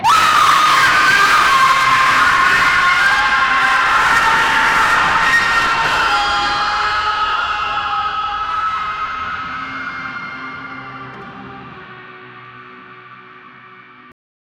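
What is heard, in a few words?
futuristic deathmetal music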